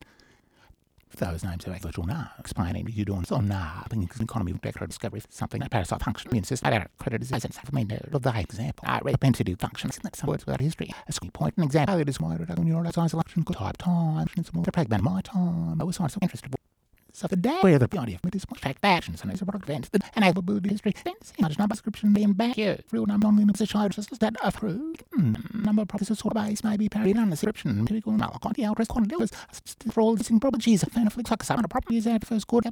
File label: glissando